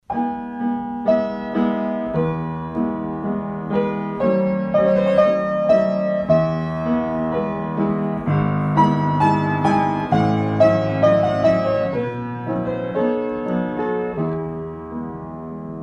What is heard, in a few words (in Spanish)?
piano